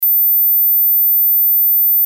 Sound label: tone, mosquito; mosquito gehoor